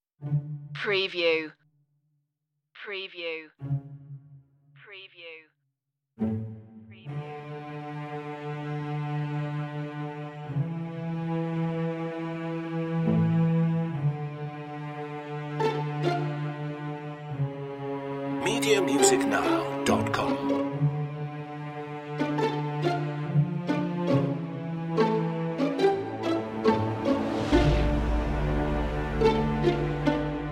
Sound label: alt mix no arpeggios